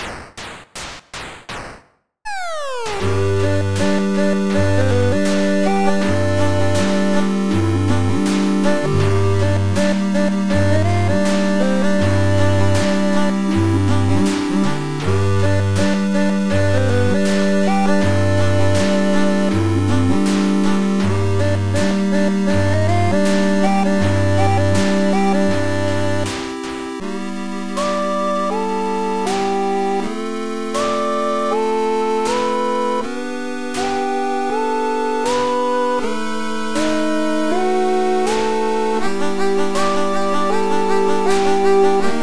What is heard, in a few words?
Home Chiptune